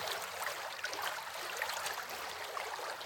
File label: Water - Running; Ed Edd n Eddy; Adromulus Pack; EEnE